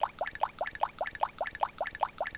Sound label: plip